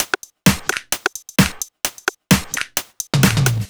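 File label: No bass drum, Drum Loops 130bpm; Zero-G; Soundclash Nu Dance Electro; Acidized Wavs; Nip Tuck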